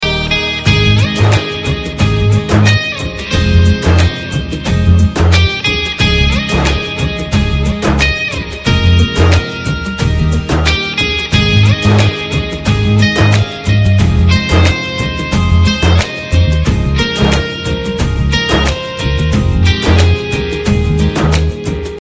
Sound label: Instrumental, Ringtone; Bollywood; Baaghi 2